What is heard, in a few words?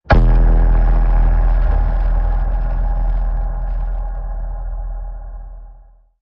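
Blast Bass Slam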